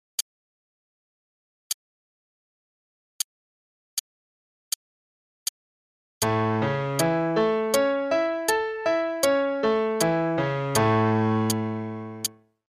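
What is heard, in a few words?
Arpeggios (A Major) (quarter note=80); CM Level 5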